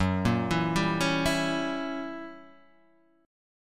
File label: Gb 7th Flat 9th, down, Gb7b9 chord; arpeggiated; JGuitar